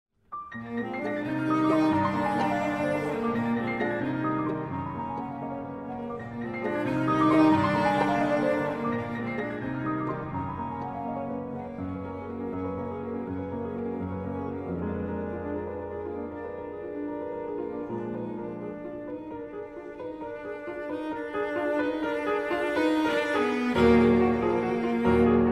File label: piano trio e minor dumky; Smetana and Dvořák